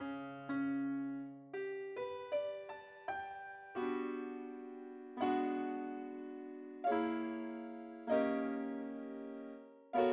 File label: piano